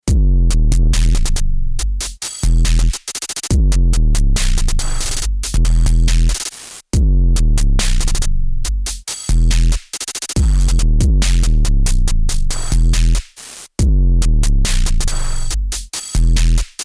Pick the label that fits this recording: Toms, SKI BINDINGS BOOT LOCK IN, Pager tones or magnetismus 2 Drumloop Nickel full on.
magnetismus 2 Drumloop Nickel full on